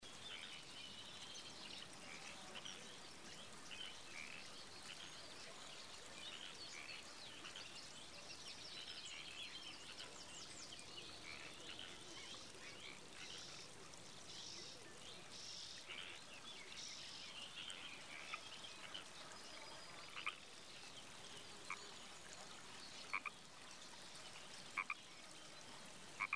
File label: Main Game Music; Bouncy Balls 2